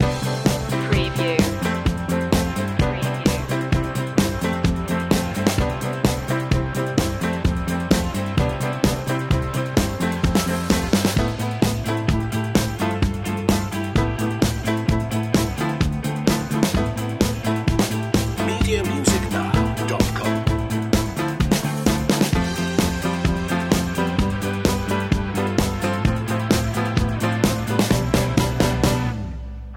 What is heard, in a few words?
streamliner loop